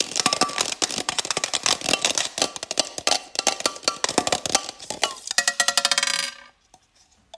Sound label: Dice rolling